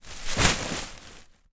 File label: foley plastic bag full clothes drop onto other and roll off